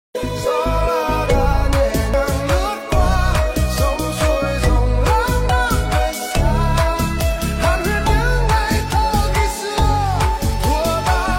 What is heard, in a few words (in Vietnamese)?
remix rock version ai cover